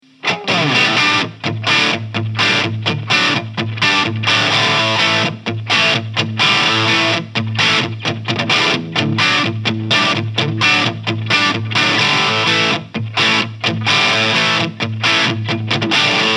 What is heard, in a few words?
Hagstrom Swede Gold Heavy Crunch